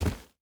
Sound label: footstep barefoot dirt jump land; Rust